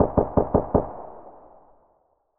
mutant tap faster, weapons; SPT Battle Ambience

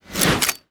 Menu Install Mod Gear; Custom UI Sounds; Call of Duty Black Ops 3

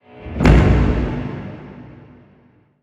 Quest Started; Custom UI Sounds; Dying Light 2 Stay Human

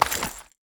Menu Install Mod Gear; Custom UI Sounds; The Division 1

